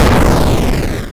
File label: player despawn; gamejam-client